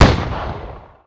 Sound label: only one bullet hit; night watch